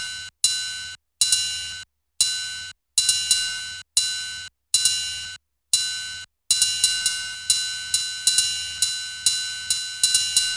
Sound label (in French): Sound Cymbale; MOBICLIC